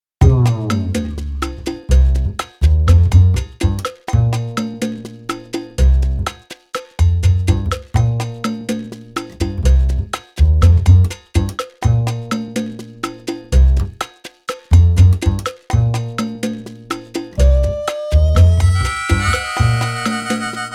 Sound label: Bass; Comic 드라마